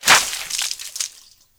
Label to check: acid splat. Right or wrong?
Right